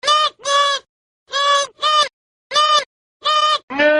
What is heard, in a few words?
noot noot sans